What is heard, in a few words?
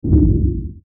SWEEPER WINDY, Sweeper Windy Bass; Production Element Imaging Element Accent Transition; Sweeper Sound Effects